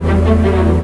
jump; baseq2; Darth Maul

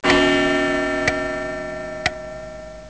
clock; civilwar

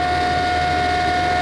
loop; lmctf

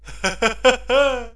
pick; baseq2

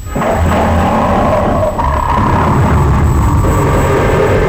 DROWN; bruticus

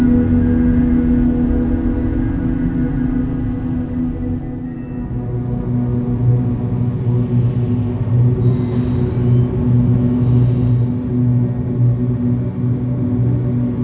ambience; spawngirlsounds

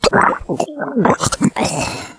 DROWN; gunslinger; crakhor

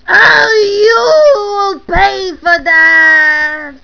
death; baseq2

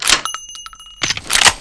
load sharps; gunslinger

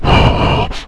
pain

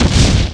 explod; lmctf

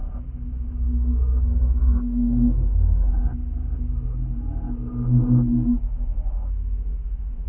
atmo

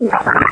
gurp

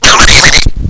pain; openffa; bong

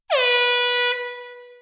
horn

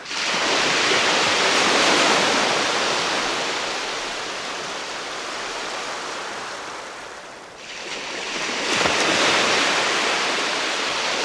sea waves; spawngirlsounds

